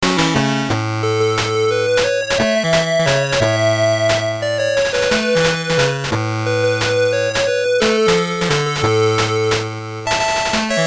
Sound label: BGM, WonderSwan Color; SD Gundam G Generation Gather Beat 2